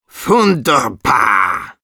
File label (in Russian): positive vocalization, ru; Wunderbar!; Замечательно!